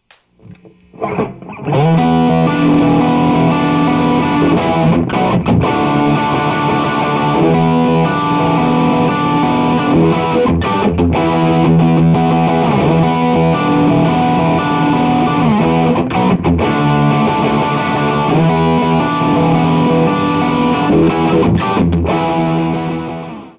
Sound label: Blitz 50 Head Gibson Les Paul